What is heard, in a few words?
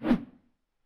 Imp Attack Whoosh, imp fireball; DoomRTX